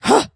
Flyer Attack; Col Flyer Attack 12

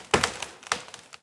Tree Creak Hit

